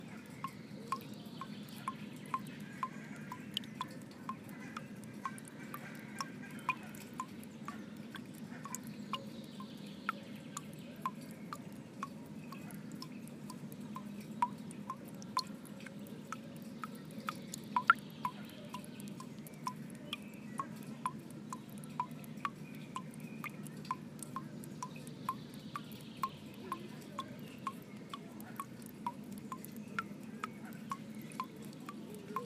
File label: water drips into a drinking fountain in Kaivopuisto Brunnsparken; helsinki